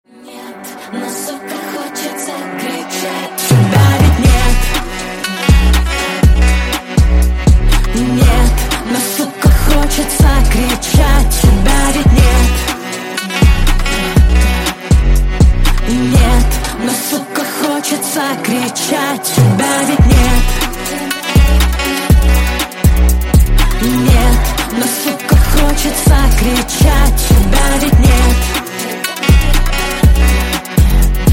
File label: electro remix